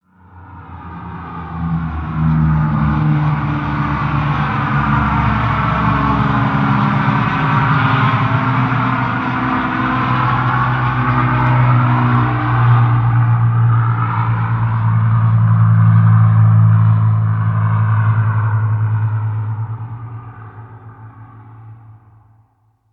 airfield hangar passby; War Thunder

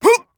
hit; Escape from Tarkov; scav1

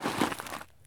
prone concrete; Escape from Tarkov

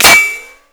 impact metal; Modern Warfare

